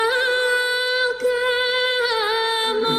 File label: ANGELIC SINGING of Bulgaria, Choir; Malka Moma